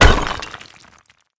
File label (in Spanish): picar